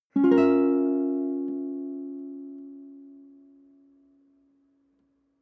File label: nylon guitar chord high diminished soft